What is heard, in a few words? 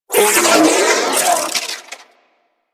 Sentient Battalyst Vocal Death; Warframe; Sentients